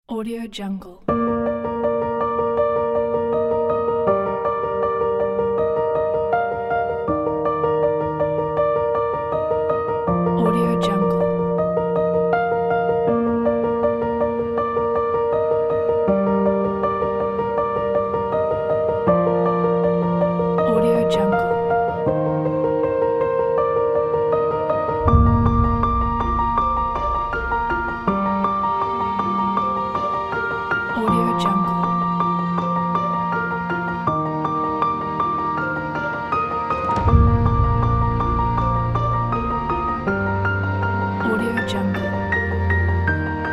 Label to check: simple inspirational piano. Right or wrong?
Right